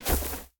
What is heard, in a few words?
Footstep; Smite; god Kumbhakarna